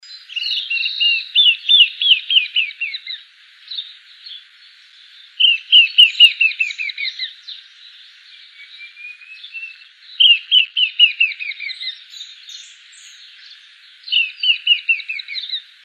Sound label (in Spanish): voz chinchero pichón